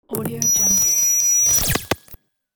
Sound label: the glitch